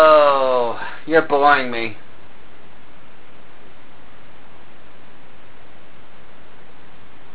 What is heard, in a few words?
laugh